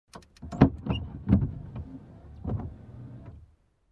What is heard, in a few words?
Car windshield wiper one time